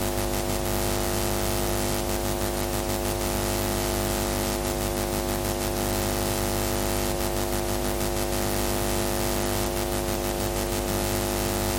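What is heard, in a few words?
constant hum with repeating hisses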